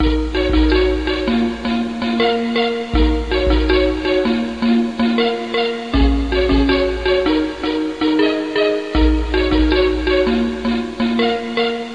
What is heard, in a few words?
Oriental; tangram